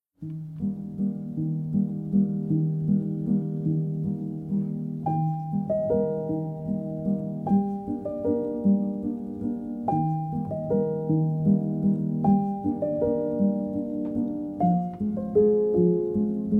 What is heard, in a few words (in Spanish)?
Melodia de Piano Para Trabajar